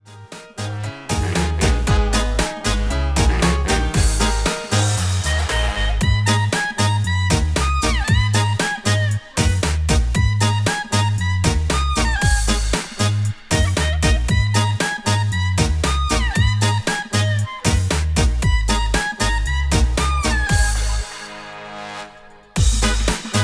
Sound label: Dub